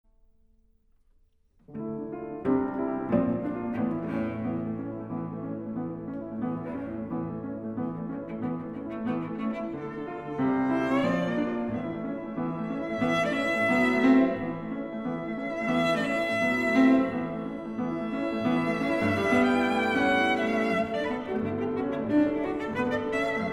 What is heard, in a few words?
Duo Arp